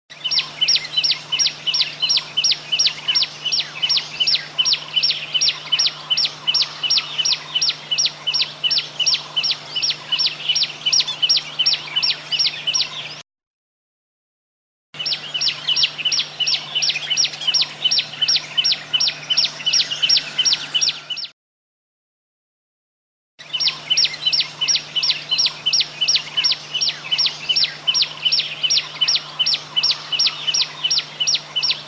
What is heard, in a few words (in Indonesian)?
Suara Burung Prenjak Lumut